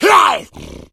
hunter shred, attack voice

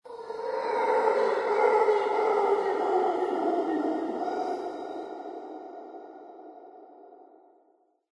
animal call yeti, lair; Source Engine; tf

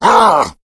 hulk pain fire; Left 4 Dead; Source Engine; tank

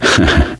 laughter, gambler, survivor voice

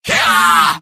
medic battlecry; mvm

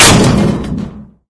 vent open, doors; Source Engine; video game

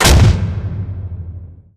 laser intro wall shake

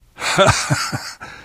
laughter; source engine; left 4 dead 2; namvet

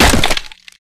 taunt sorcery staff break; video games; source engine; tf

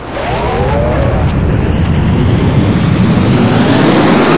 particle suck, ambience

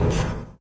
ladder, footsteps, survivor, run

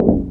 glass, footsteps run; player; source engine; left 4 dead 2; infected

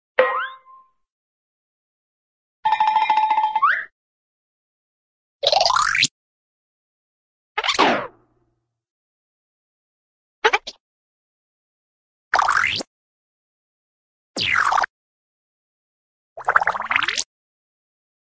zip, cartoon cowbell zip

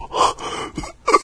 citizen beaten, voices; Source Engine; video game

